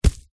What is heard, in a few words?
impact body fall to ground